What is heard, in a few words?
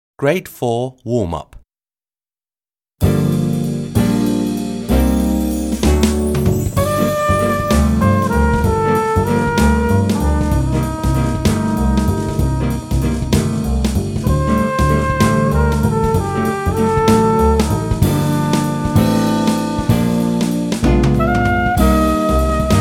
Warm Up; Ballet